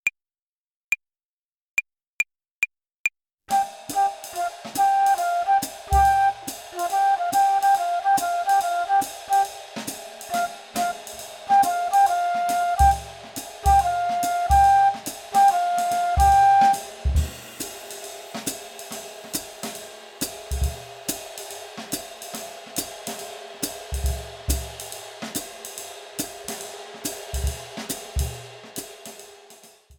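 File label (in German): Swing Phrasierung Übung; play along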